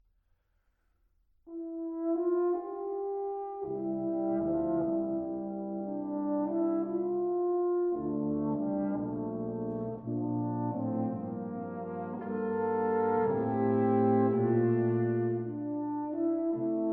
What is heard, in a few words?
Brass Quintet Song; Art Collection music of Arthur Frackenp